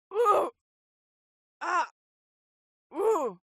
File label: HUMAN VOCAL Female Pain; smartsound